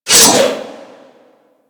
Great Sword Swipe

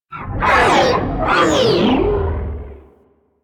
Sentient Ship Attack Maneuver; Warframe; Enemy Ships; Space Fighter Sentient Coil Agent

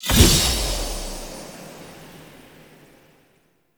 Blood Lust Impact; Powers; Fey; Champion

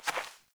Fawn Footstep, Foley; Animals; Ambient

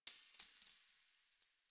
Coin Drop

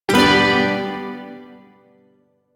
Shawzin Maj Chord; Warframe; Duviri Shawzin

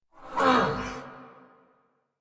Attack Effort; Warframe; Dax Knight; en

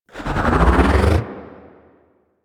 Bow Draw; Stalker Bow

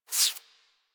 Foley Idle Lick Furr; Enemies; Warframe; Catbrow

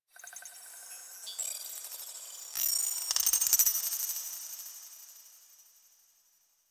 Glass Texture Small, Ambience; Warframe; Cephalon Weave; DE

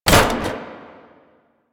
metal ladder hit

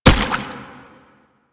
Step Water; Warframe; Proto Infested Brachiolyst

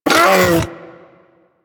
Predasite Vocal Pain; Warframe; Hunting Critters

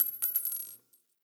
Shell Casing; Target Practice; Warframe; Nineteen Ninety Nine; Quests; Quincy

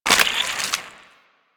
Sniper Rifle Reload; Warframe; Infested

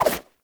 Run Whoosh, Foley, Footsteps; Warframe; Lasrian; Lasrian Soldiers; Lasrian Butcher

